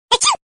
sneezing sound; hachi

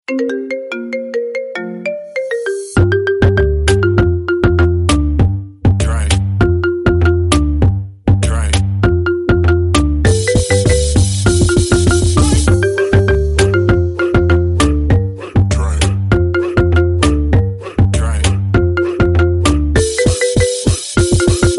electronic echoes

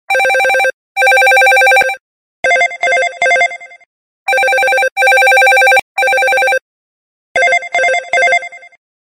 cell phone

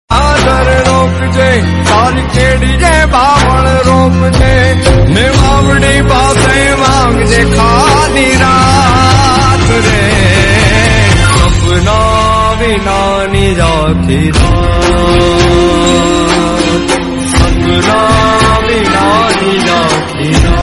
gujarati song

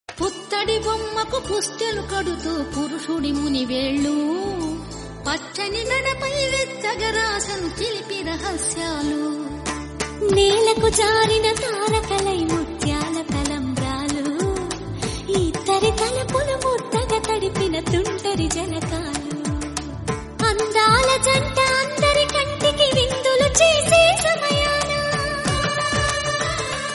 song music, bgm, background music

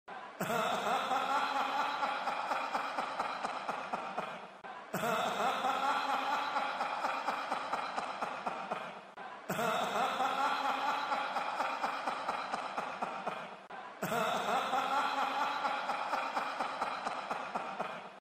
laugh; the fiend

